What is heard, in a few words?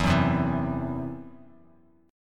E Suspended 2nd Sharp 5th; Esus2#5 strummed; 12,9,10,9